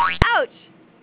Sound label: boink ouch